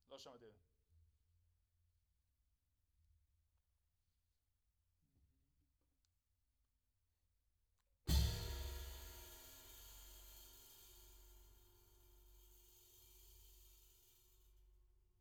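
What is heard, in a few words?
Floor tom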